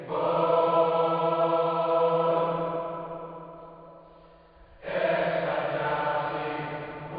Choir

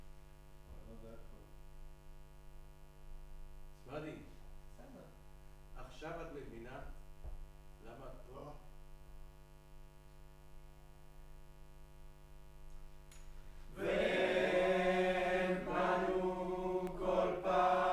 Room, Choir

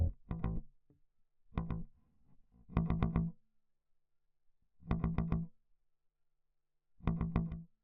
Bass Mute

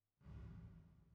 480 Rev

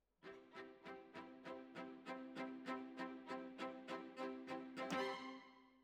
Cellos Over

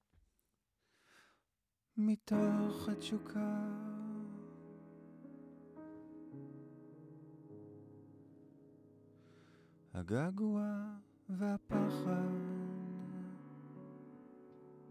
Voc

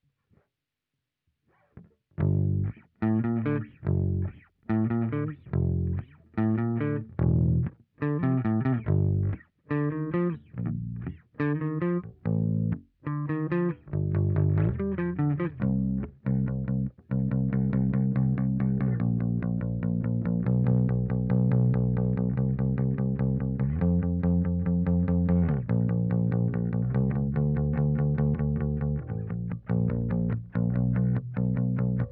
Bass DI

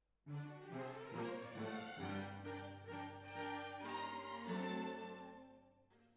Cbasses

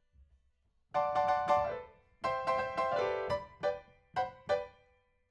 PIANO, RNR for piano

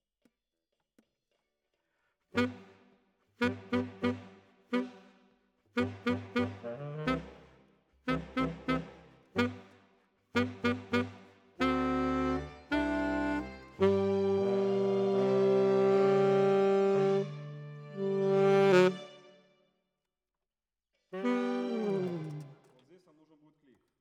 Alto, RNR for piano

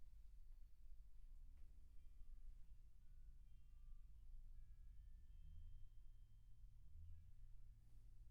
Room, 160 BPM

